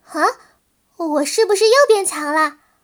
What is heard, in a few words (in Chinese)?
升级, BattleCry; 莉莉